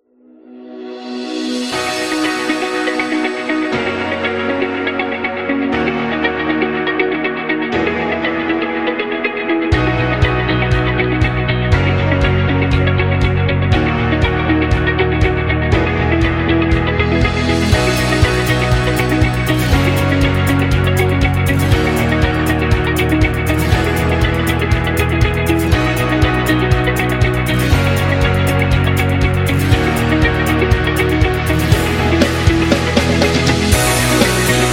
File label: Modern Energy